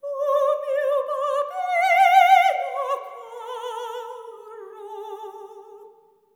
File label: SOP. TRAD, Symphony of Voices; Spectrasonics